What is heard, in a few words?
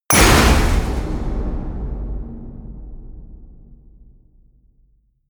hit metal; hits explosionen; metall